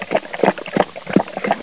Dog Drinking Water Sound Effect

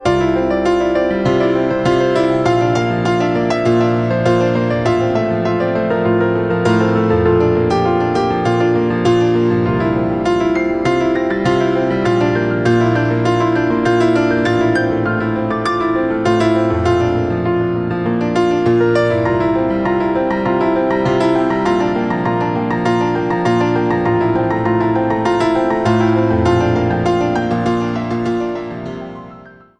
Piano instrumental love song

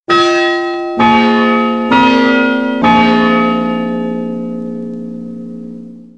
sound of bell

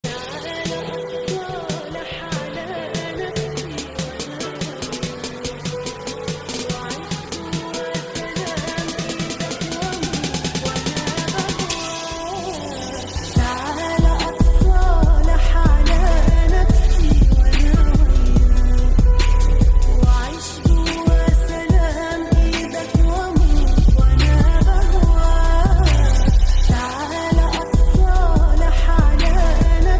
Gojol Arabic Islamic Ramzan

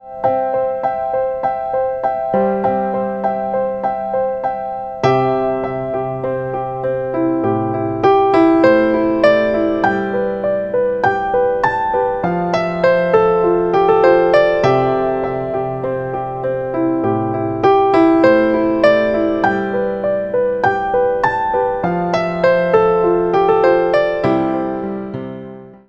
Love romantic piano music

